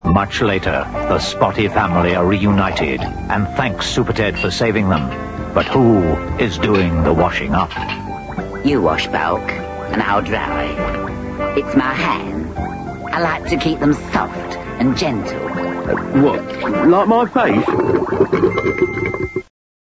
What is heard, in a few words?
wash; classictv; superted